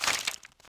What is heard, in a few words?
Taunt spy buy a life bill throw; [бросок купюр]